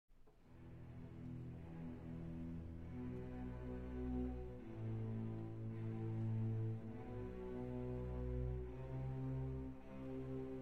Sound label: Turkish symphonic music; Turkish Five-4